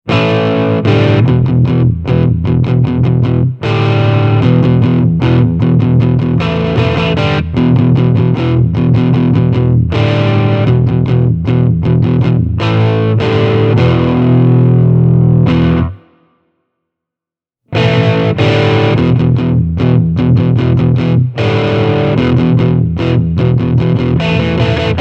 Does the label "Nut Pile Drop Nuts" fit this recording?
No